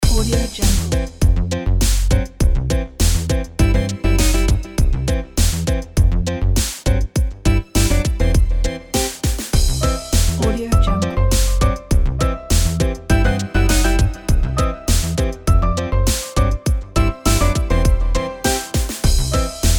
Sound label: retro music; yesdori